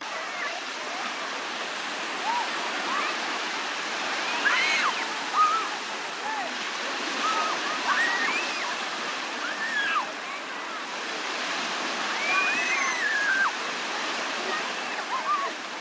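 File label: children playing on the beach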